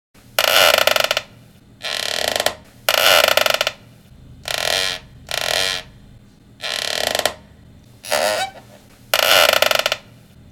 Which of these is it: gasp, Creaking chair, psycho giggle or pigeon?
Creaking chair